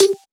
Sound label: Percussive